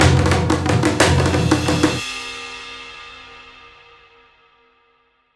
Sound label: Loot Run Cue A Start; Warframe; Orokin